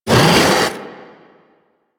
Rock Punch Cast; Warframe; Brawler

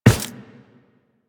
Run Default, Footsteps; Warframe; Tenno

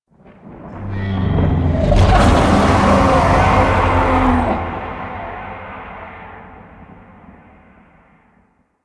Void Dragon Vocal Distant Idle; Warframe; Duviri

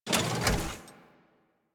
Mech Step Arm Down; Nechro Mech; Vehicles

